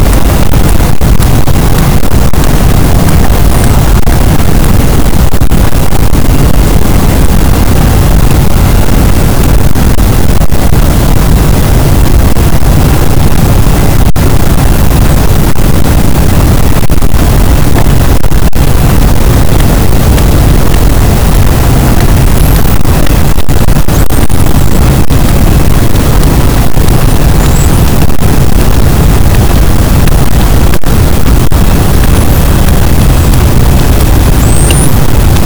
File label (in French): cachalot positif, boat, Physeter Macrocephalus